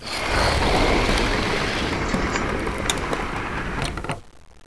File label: door move